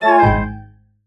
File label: Failure Ensemble Small Dry, RPG Orchestral; InspectorJ; RPG Orchestral Essentials (Music FX)